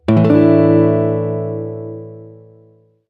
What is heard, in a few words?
a sus4, chord